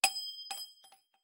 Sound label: shells 20mm bolt; guns; tanks; War Thunder